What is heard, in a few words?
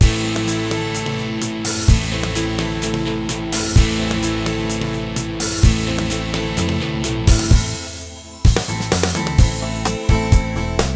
turk rock, groove